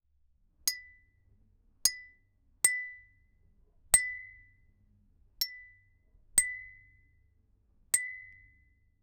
tintineo de metal o vidri

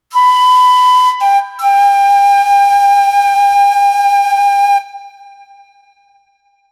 el flautista debe crear u, flute

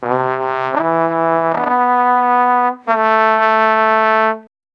trompeta para dar aviso, ominous

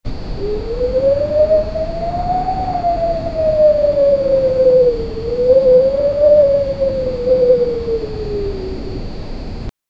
torre viento proyectil en, projectile

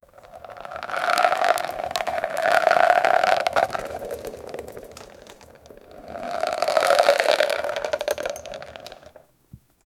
bola de paja rodando, western

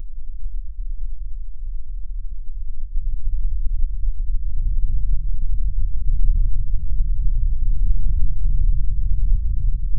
todo se queda en silencio, silence

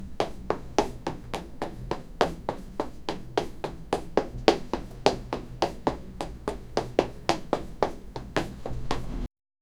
pasos de una chica con, room

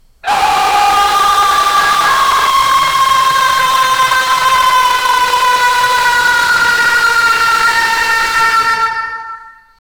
un grito muy aterrador

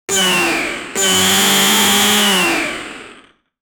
jumpscare de animatronico

